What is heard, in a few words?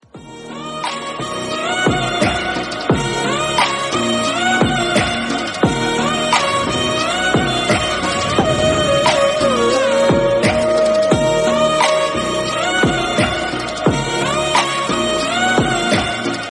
instrumental ringtone tamil